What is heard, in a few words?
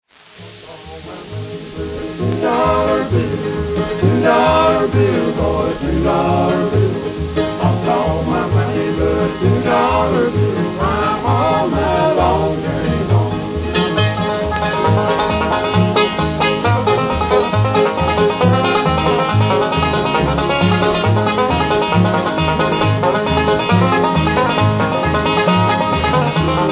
bluegrass